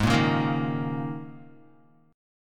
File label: Ab Suspended 2nd Suspended 4th Ab down Guitar; strummed; JGuitar